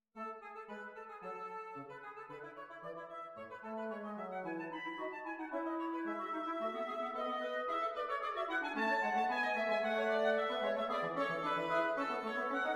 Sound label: Sinfonia in A Major; No. 12; BWV 798